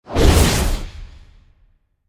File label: skill sprint